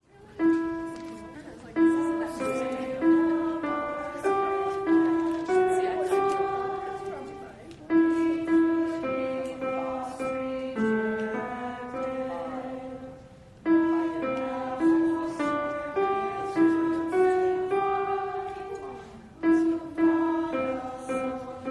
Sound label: alto; 687 A Mighty Fortress Is Our God; Gather Hymnal